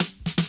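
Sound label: snare, drums; mercure drums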